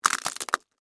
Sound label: Crack Crunch, Texture, Crack, Crunch, Penne, Rigate; Food Sound Effects; Household